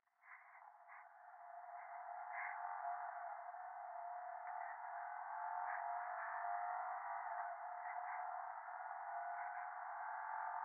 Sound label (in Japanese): 電話 左耳快感吐息 車の中